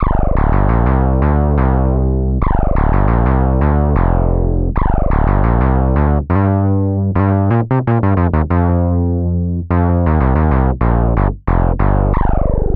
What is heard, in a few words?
Feedback Funk; Saurus